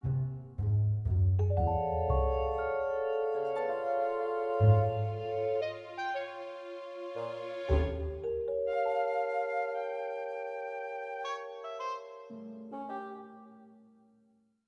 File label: Music Future House; Costume Quest 2